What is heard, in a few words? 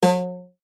cartoon spring pluck